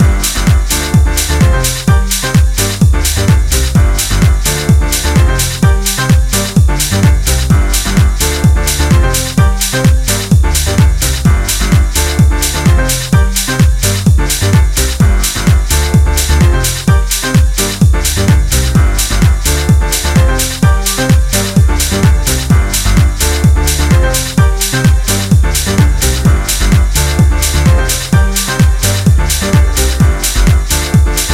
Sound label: Instrumental